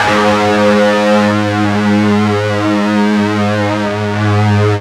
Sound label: FUZZ G#1, Guitar; East West; Steve Stevens Guitar Samples Collection